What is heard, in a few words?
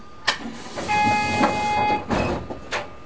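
Door Open Right, Train; OpenBVE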